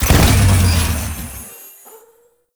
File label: Boost, Motorcycle; Warframe; Vehicles; Motorcycle Ninety Nine